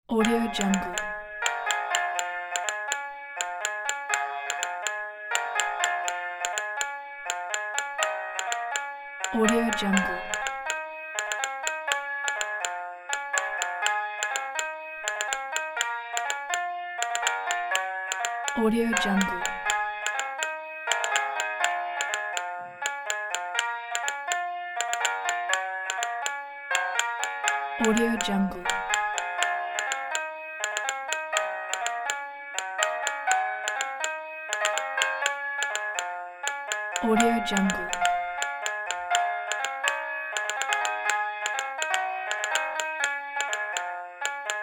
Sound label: musical toy